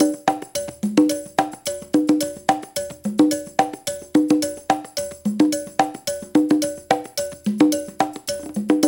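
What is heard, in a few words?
108 bpm, conga; Spectrasonics - Supreme Beats - World Dance; Conga Basic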